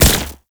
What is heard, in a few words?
Concrete Archgun Projectile Explode; Warframe; Archwing